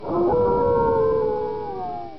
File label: Wolf Howl